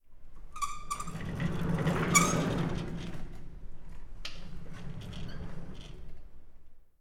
SFX przesuwanie maszyny na kolach po szynach

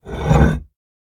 otwieranie paszczy kamiennego posagu; Apocalipsis; PC, Linux, macOS

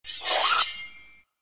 sciaganie maski

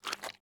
kroki po rybie

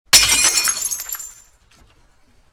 rozbite lustro

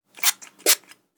spray, rozpylacz; apocalipsis

